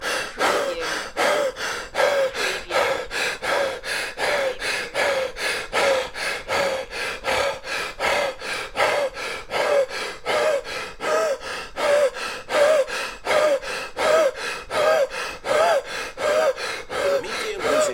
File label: Breathing, Male, Severely Out Of Breath, Wheezing